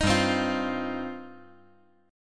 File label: G 7th Suspended 4th Sharp 5th